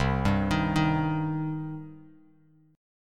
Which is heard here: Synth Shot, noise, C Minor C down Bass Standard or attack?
C Minor C down Bass Standard